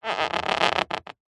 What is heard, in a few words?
foley leather or similar squeak creak short; zapsplat